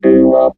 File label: vocoder short; nagslang